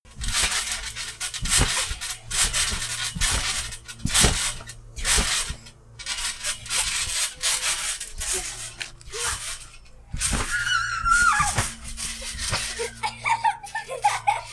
trampoline

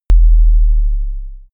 sub effect

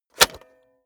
cassette player slide tray insert medium

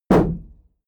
shield sheet impact dent soft

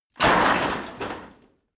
metal rock impact multi

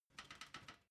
ladder metal handling soft

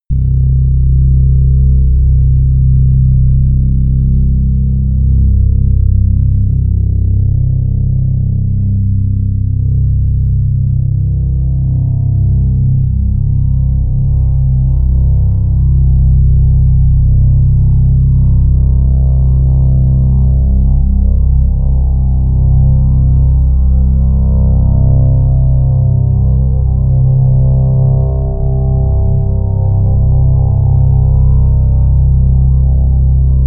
drone bass

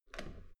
metal door heavy handle up soft

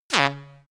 fart horn

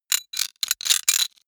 robot rustle click

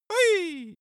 cartoon male jump

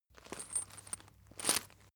chain mail movement

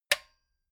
metal button stereo spring push

